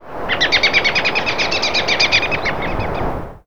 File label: American Robin; woods; Ariel Foundation Park